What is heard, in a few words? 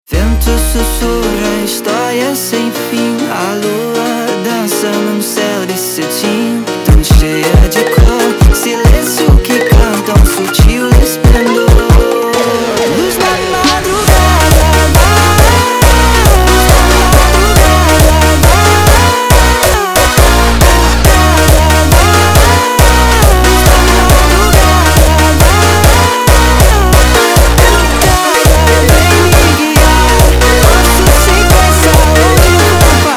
Sped Up Hardstyle Remix